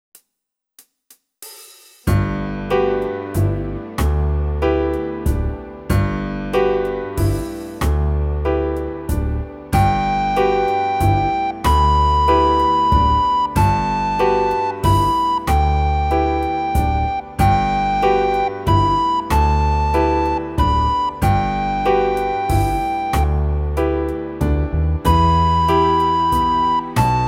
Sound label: Waltz Practice; red hot recorder tutor